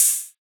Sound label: Open Hat; One; Cymatics; DOPE DRUMS VOL. 1